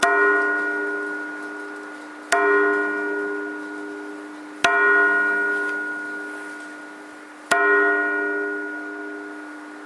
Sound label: justice bell